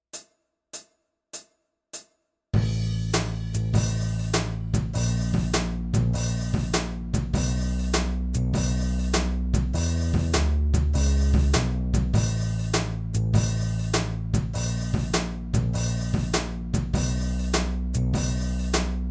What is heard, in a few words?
Extended Harmony Chords